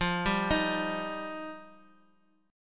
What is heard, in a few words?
F 7th Suspended 2nd F down; F7sus2; arpeggiated; JGuitar